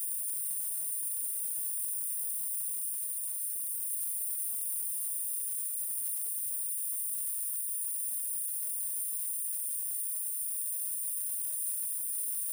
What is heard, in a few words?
single pickup, Gas Leak; crysound; Handheld Acoustic Imager